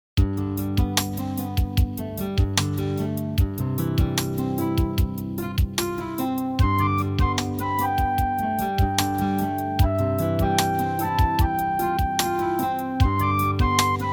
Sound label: Tender Moment; Soundzabound Volume 1